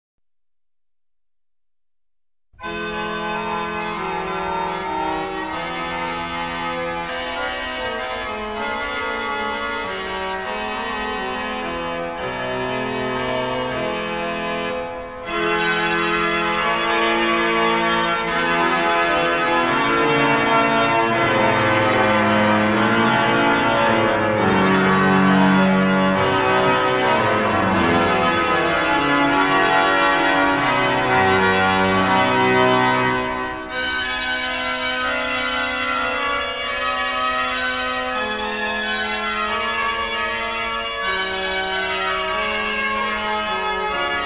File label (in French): Dialogue, orgue; Couperin